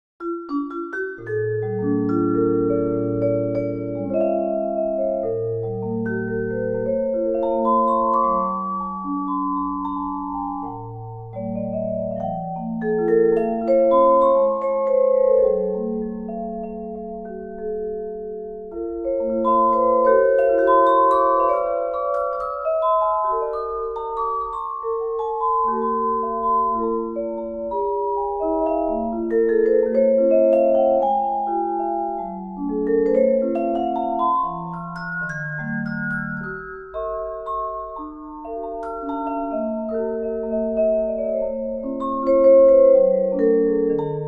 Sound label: vibraphone; virtuosity vibraphone; versilian studios